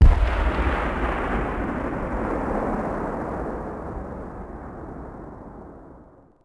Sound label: distant gun; WAR